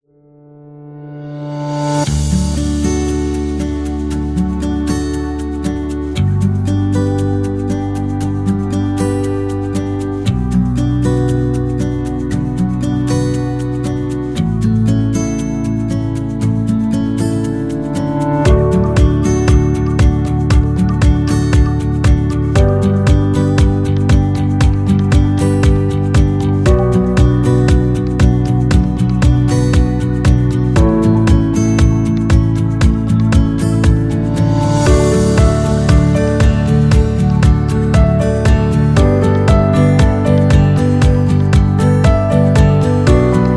Calm; corporate music vol 12

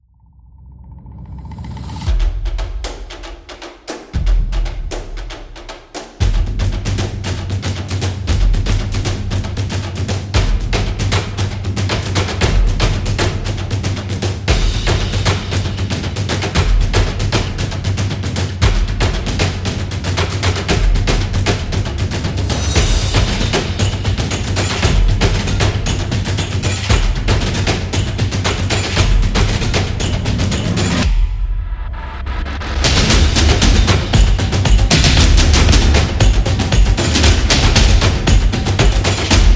Epic Drums Tribal; tribe

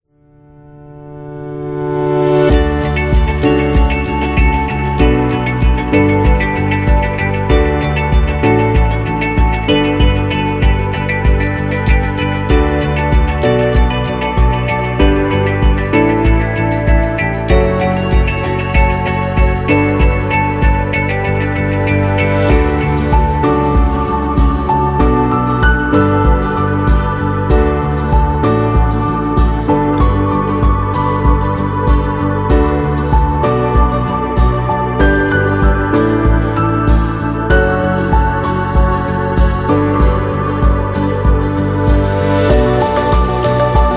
Emotional Corporate; corporate music vol 16

